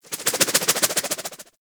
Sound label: Glaive Idle; Warframe